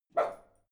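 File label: bark small, dogs; natur-tiere; hunde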